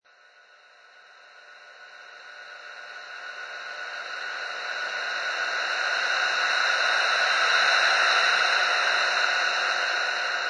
Ectoplasm Presence, Ectoplasm, mysterious spirit or ghost passing by; Long, drawn out sound of apparition, poltergeist or phantom appro; Lynne Music